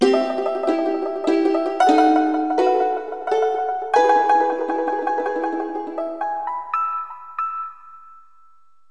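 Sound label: dulcimer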